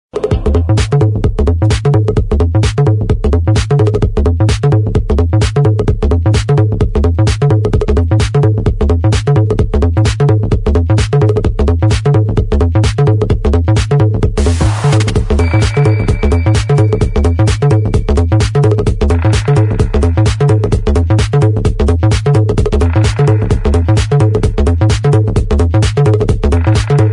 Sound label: House Techno Ringtone